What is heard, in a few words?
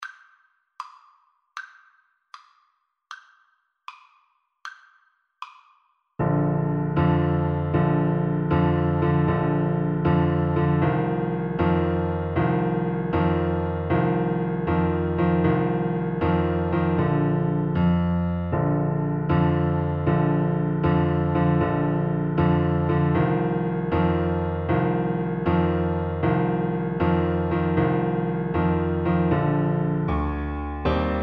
mexican hat dance, Tuba